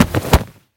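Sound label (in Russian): захват и перекидывание через плечо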